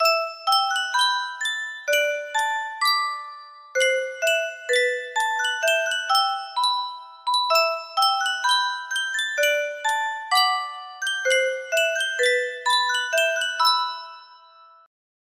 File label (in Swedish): musikdosa